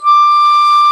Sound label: SHAKU, WOODWINDS; Roland - LA Composer vol. 1